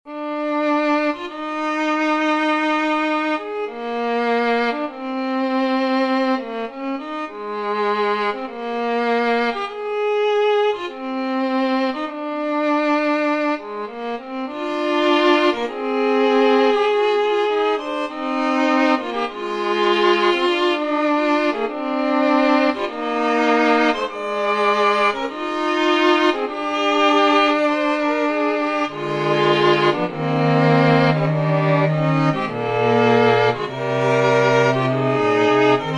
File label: 21edo Fugue Expo